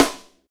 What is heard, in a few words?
snare, hip-hop rap, Roland; Northstar; Drumscapes Roland